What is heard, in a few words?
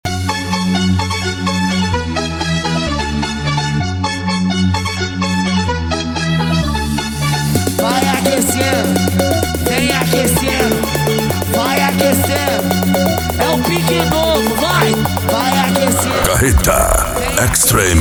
extreme bass; carreta